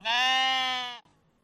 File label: sheep